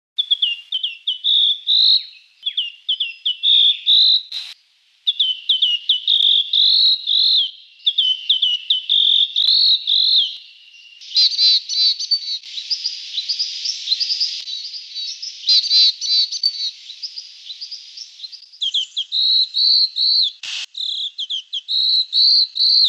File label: rose Robin; robins; canberra garden birds